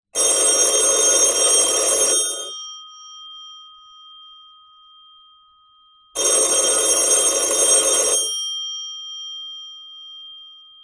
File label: ericsson dbh15 usa standard ringing; 1947; Sound Ex Machina